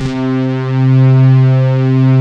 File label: SYN E KEYS, pads; Pro-Rec - Synthsonic; TR PADS